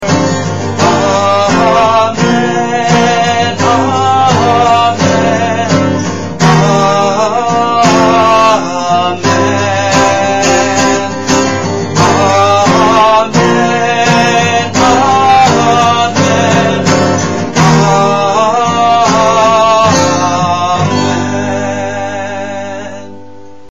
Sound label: Amen; ca doan teresa